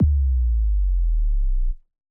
PWM Kit 142 BPM Subdown; Vengeance - Essential Clubsounds Vol.5; Demo Constr Kits; Root Gmin

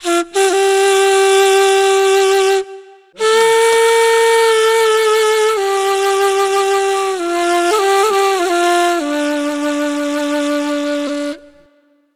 Ney, Wind Instruments; Sonic Foundry (Sony Creative Software); World Pop

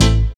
SYN PIANO, dance synth; Sound & Vision - Gigapack I CD 2 (Roland)

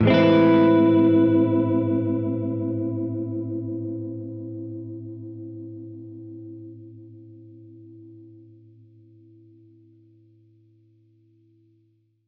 Gretsch Chord Amin9[maj7], Guitar Chords

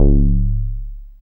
Bass SH-101

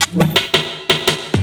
LOOP, 160 BPM, Drum & Bass

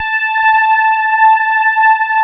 electric pianos; Wizoo Electric Pianos

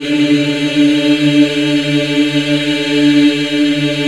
D3, Choir; USB Soundscan vol.28; Acoustic & Synth; AKAI

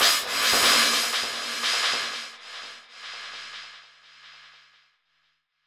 crash R; Zero-G; Chemical Beats; CRASH ZOO

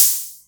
Roland MC09 Hat O

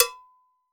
Mambo Cowbell; Roland BK-5; Percussion

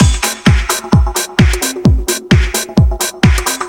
House

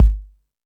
Toms, E-MU Pro-Cussion

